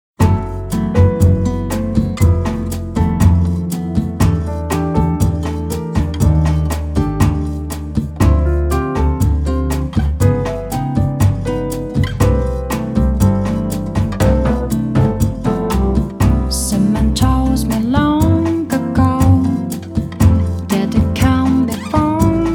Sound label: Bossa